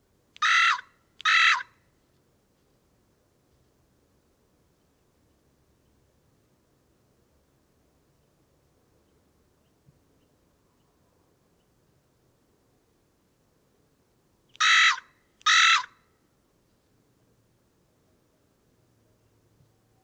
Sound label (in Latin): Corvus corone; SETE